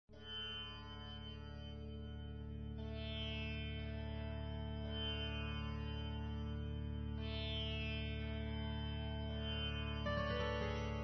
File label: Fusion Music Raga; RKC Live Stream; Travel India